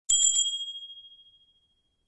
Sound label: preannounce; homeassistant; assist satellite